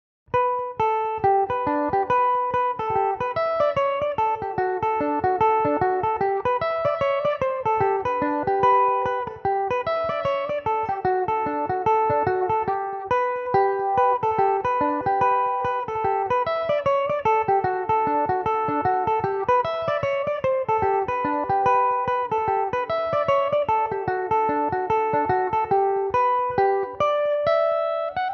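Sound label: G major, Irish mandolin; Belmuse EM-190 electric mandolin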